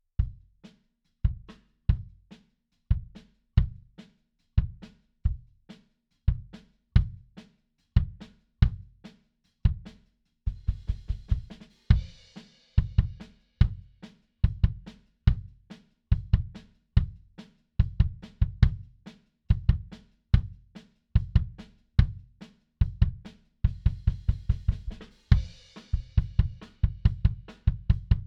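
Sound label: Bass Drum In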